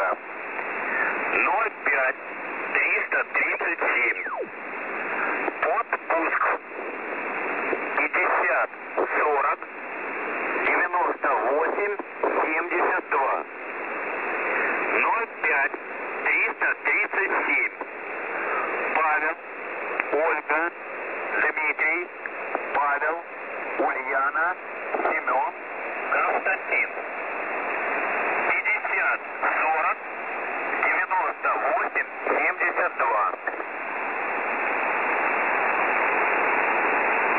The Pip, 3756.0kHz, numbers stations

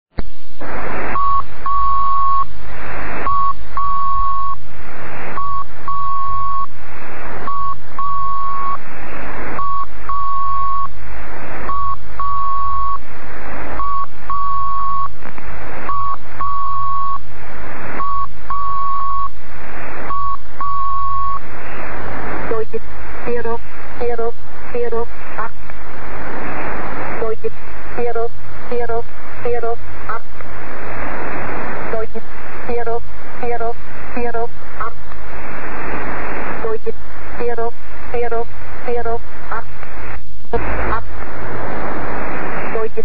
Four Note Rising Scale, numbers stations

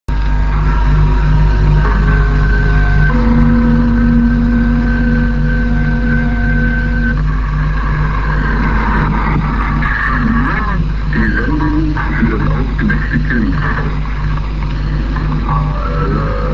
Gongs and Chimes Transmission, numbers stations

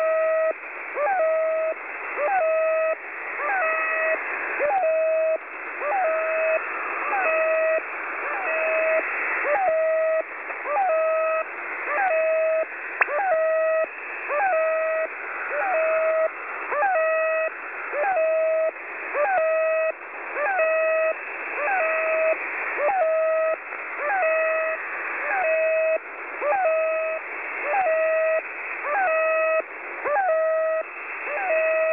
Signal Marker 6360.0kHz

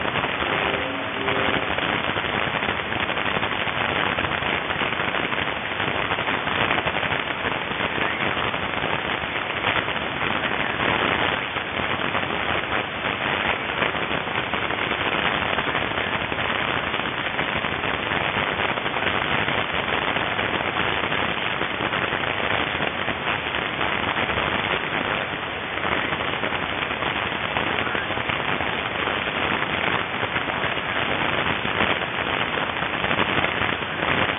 numbers stations

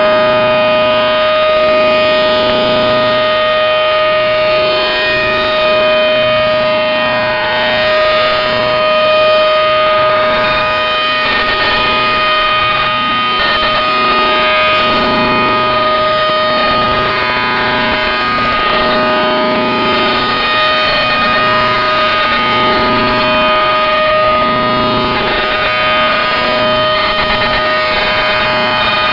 Oddity Signal; 5354

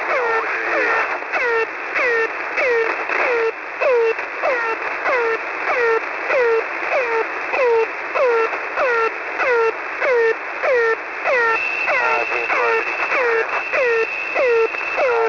Air Horn Test Count, usb

